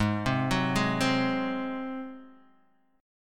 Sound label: Gb Suspended 2nd Flat 5th Ab